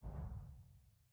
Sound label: dragon transition region